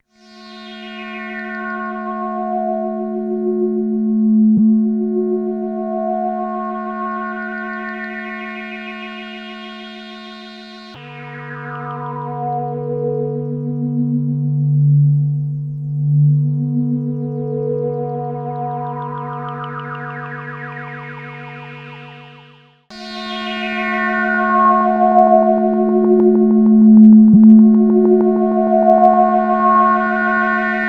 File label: duophonic fdbk pad